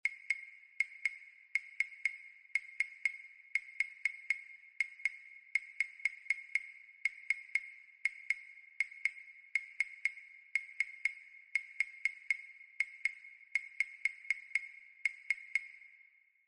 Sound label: Claves; Siyahamba